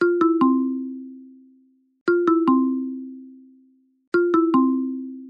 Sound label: Reconnect; GrabCall